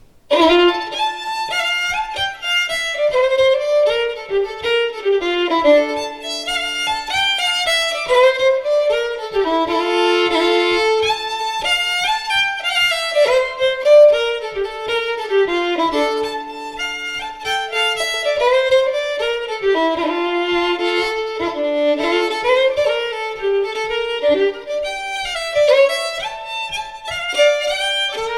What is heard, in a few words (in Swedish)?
Malva polska